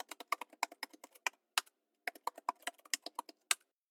Keyboard

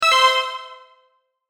correct; BSのクイズ番組で使われそうなピンポン。; 正解の音・ピンポーン

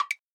Woodblock

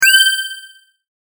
sword

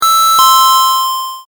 correct; もっとゆっくりのピンポン高音ver; 正解の音・ピンポーン

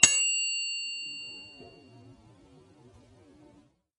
bell

